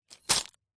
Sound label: industrial small plastic bag of metal screws catch; zapsplat